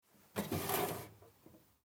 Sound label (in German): Schublade klein